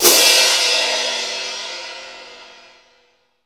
ORCH. CYMBALS; InVision Interactive; Lightware VOL-1

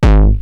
Roland TR-909 BD; BD Selection

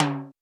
Roland U220 Electric Set, Drum Machines

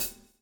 SWNG

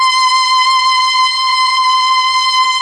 STRING; ILIO; Propeller Island Legendary M400

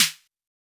Snare, Drum & Bass; AMG - Prototype Drum & Bass; Snares

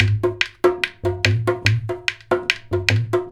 DHOL; USB Soundscan vol.45 - Indian Traditions; AKAI

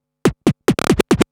Scratch Fills 140BPM

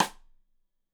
Side Stick Stereo; Deeply Sampled Drums; SMDrum

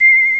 pling; Amiga Soundtracker Sample Packs

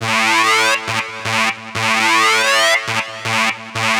Techno Synth

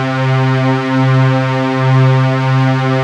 STRING; E-MU Formula 4000 Series Vol. 2

